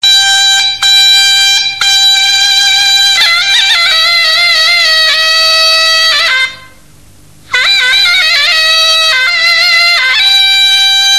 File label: zurna